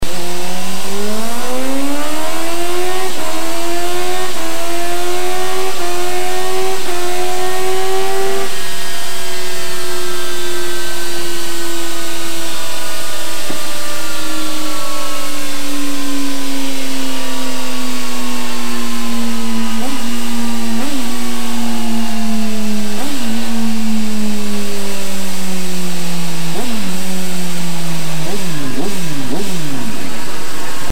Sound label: Honda 929RR leovinci pipe